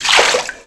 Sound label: aqua elemental move